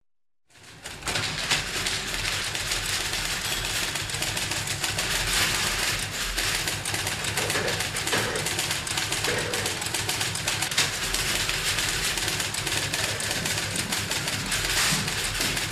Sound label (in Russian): zvuk ofisnoj atmosferi pechatanie na pechatnoj mashinke